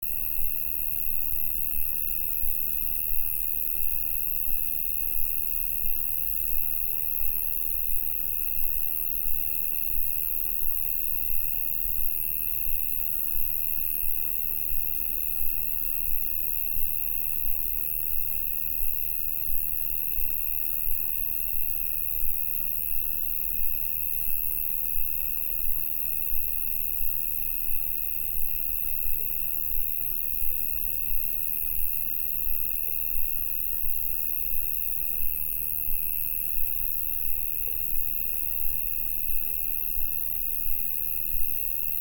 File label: crickets; sferics; barnave